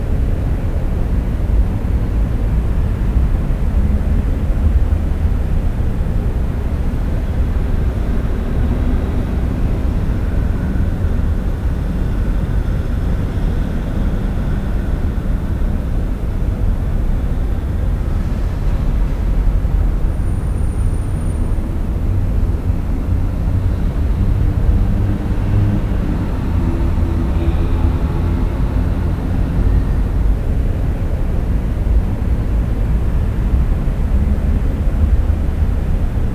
Ambience Ruins; donkey kong country returns 3ds wii wii u 2010

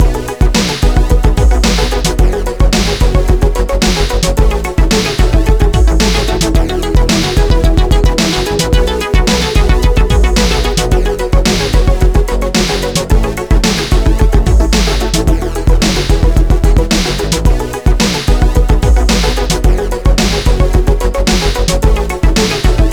Sub Menu; DanceDanceRevolution X; arcade; PS2

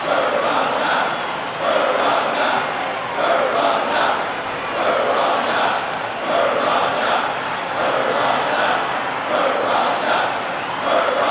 Crowd Song; mario strikers charged gamerip; Petey Piranha's Crowd Song; US, EU

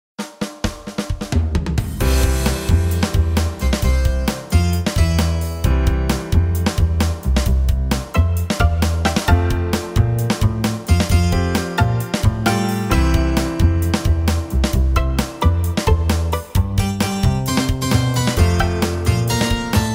Pause Menu; MySims Kingdom

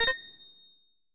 Coin

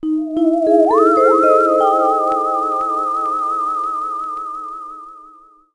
Sting Player Down; Insectosaurus; Monsters vs Aliens; NDS

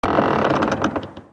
Creak

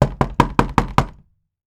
door knock hard wood

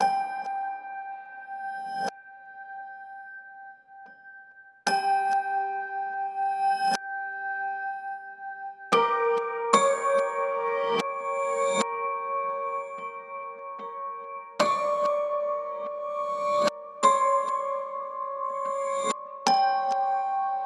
Phonograph 74 BPM; LondonPhonographGame

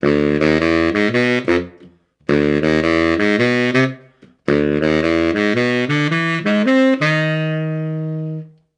Bollywood Pickup

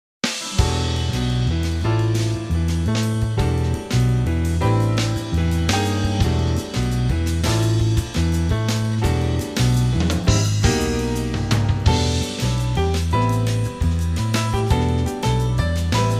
Jazz

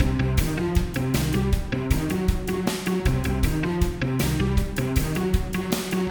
City Platforming MIXED; Ben 10

